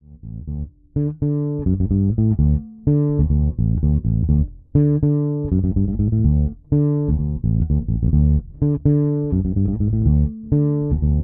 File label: Fender Funk